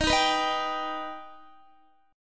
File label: D sharp Augmented D sharp Guitar; D#+ strummed; x,18,15,16,15,15